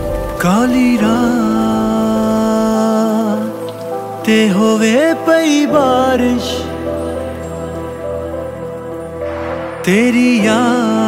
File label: Punjabi Song